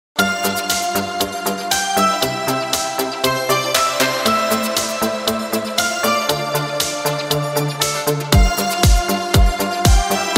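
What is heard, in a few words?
eurodisco remix extended synth version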